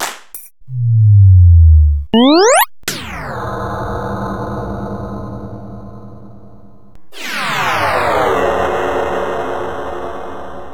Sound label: Korg S3; Sliced